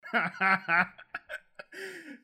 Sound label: laugh